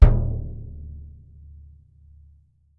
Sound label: BD MF, Percussion; Miroslav Vitous - Percussion & Harp